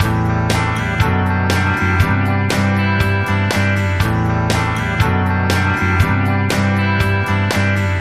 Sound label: Barnfire - Loop